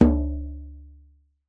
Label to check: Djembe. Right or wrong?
Right